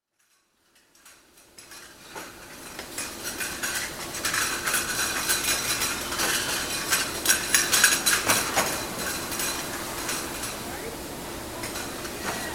China Factory, checking the ware for faults, with voices in background; Factory Sound Effects; BBC